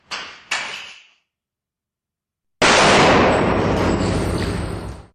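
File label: bomb and grenade sounds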